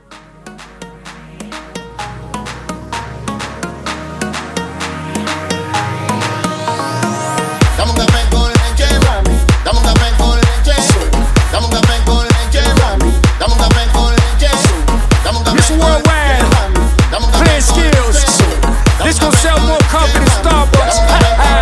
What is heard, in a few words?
Fiesta Latina Mashup 128